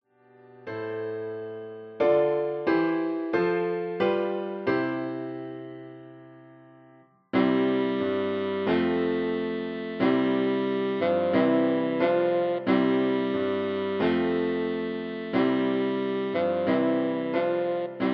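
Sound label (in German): Tenorsaxophon; O du fröhliche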